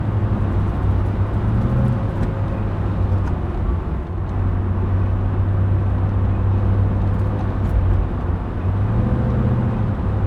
LOOPING ENGINE RUMBLE

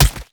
Snappy Hard Hit

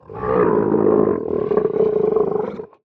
Dragon; Bahamut Dragon

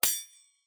Blade Sword Hit

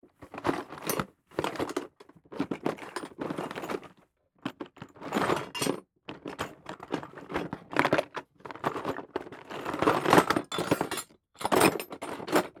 Rummaging Tool Box

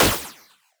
Futuristic Laser Weapon Gunshot

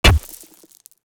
Break Concrete Slabs Impact

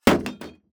Large Metal Item Sheet Impact Scrap

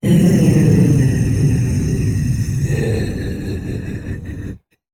Zombie Creature

